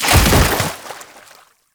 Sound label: Body Fall Light Water; Enemies; Warframe; Tau Dax